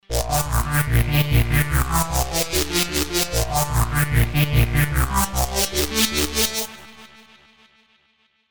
Donkey Lead

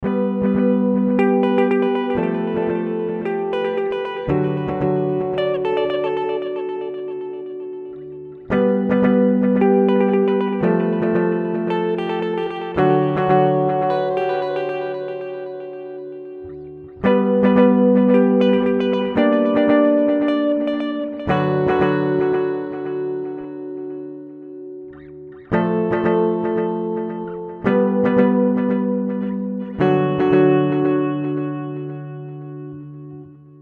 Seymour Duncan Dark Sun

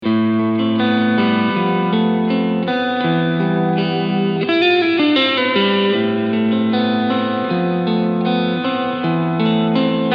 Keeley DDR Overdrive Reverb

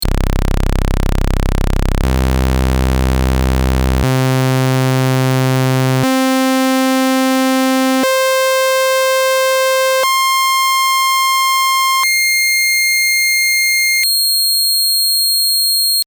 Saw

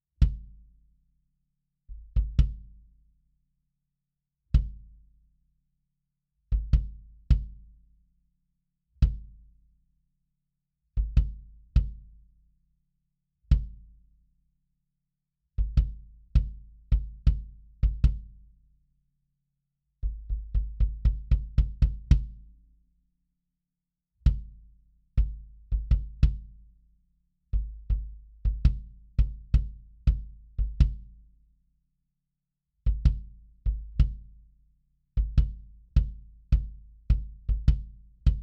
Kick Classic; sE Electronics V Kick

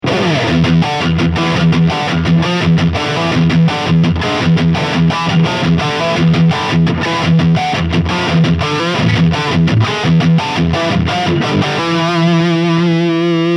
LP Marshall 2004 SD1

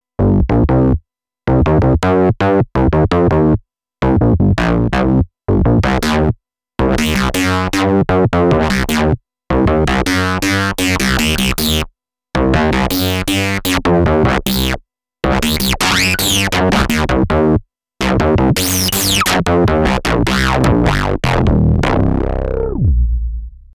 C15 Subtractive

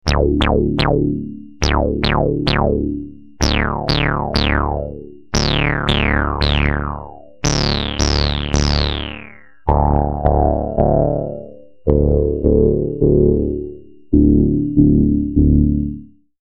decay

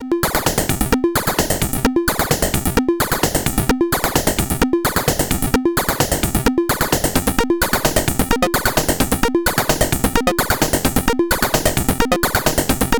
ACL Multi Function Discrete VCO FM Exp Sync tri

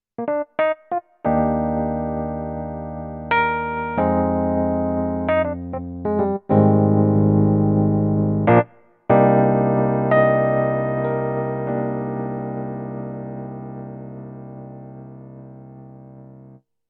Roland HP702 Suitcase E Piano

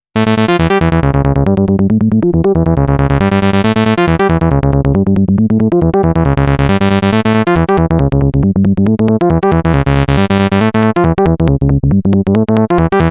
Swing

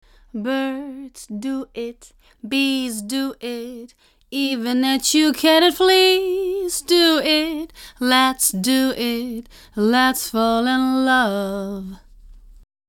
Enhancer Master Lo Hi

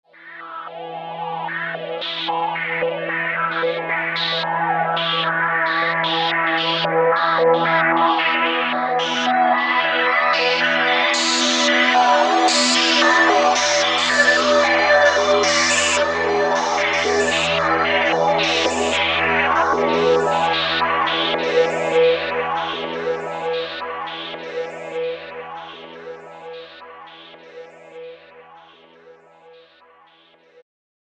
SH Noize Pad CS80V

